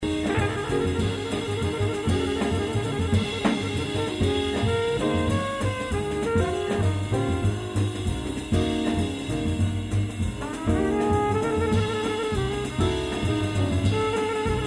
jazz

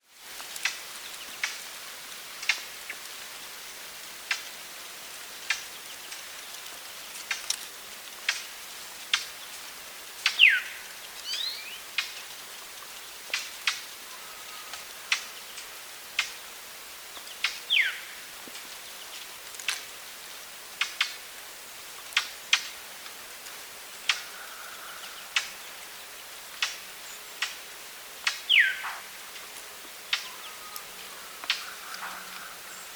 brown thrasher call-notes; l.g. price

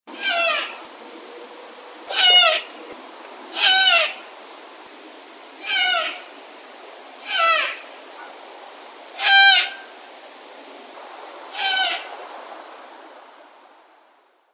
owl; Kilgore

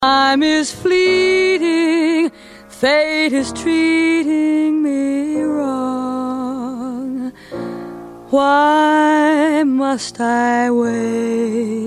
jazz

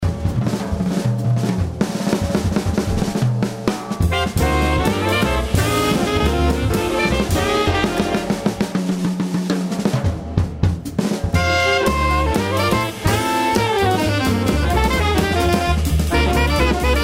jazz

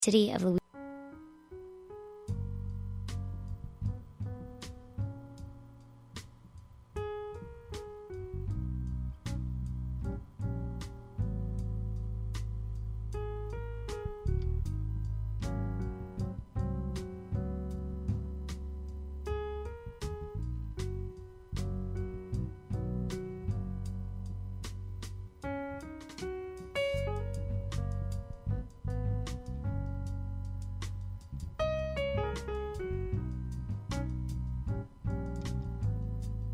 jazz

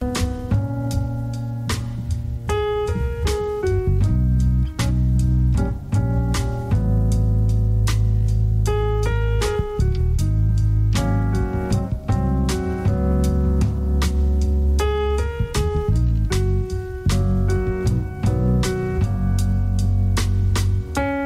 jazz

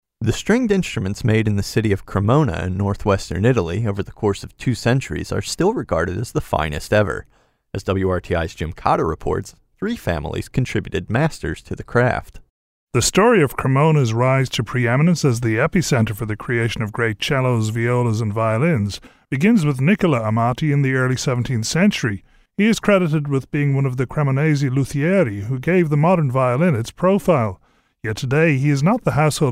Violins

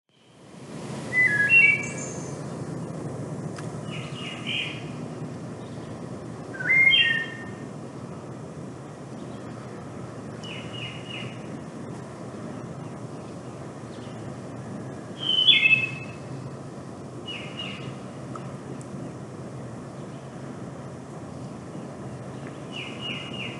wood thrush; jason johnson

